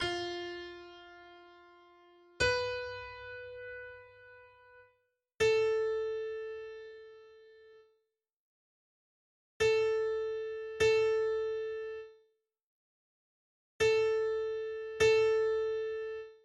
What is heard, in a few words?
Notes Crossing Bar Test